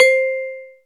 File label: vibraphone; Mallet